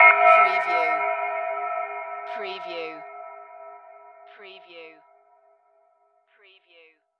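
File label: Production Element Hit Bell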